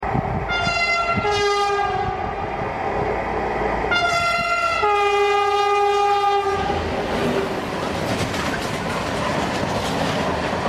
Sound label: horn; DSB MZ